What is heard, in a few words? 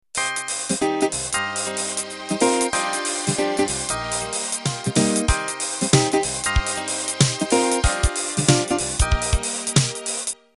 Swing Rock Intro